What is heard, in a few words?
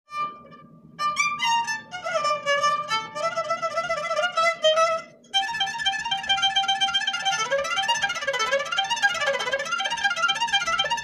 Ghijak; تاجیکی